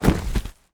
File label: Horror Body Drop